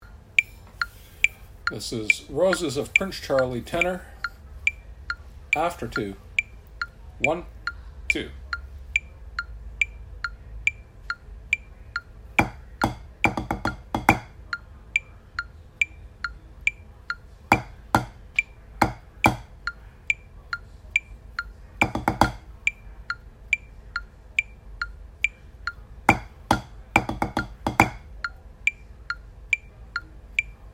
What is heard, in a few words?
Tenor; Roses Prince Charlie